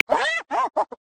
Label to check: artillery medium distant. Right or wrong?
Wrong